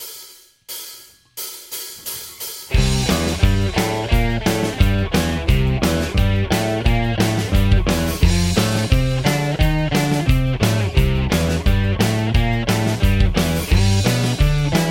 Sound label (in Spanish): bajo bateria guitarra; historia del rock